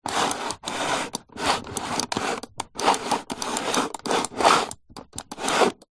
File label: technology computer mouse old plastic slides clicks movements wood table